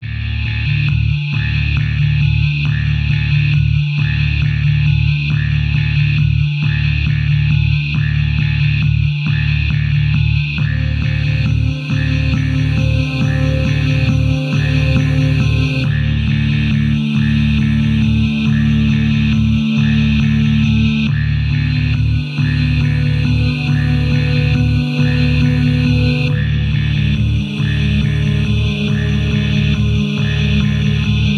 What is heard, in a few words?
Deep Minimal Brooding Scary; CUES for film TV games etc